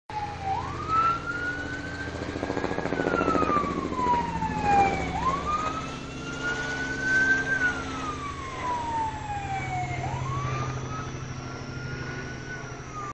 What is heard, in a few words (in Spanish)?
helicoptero policia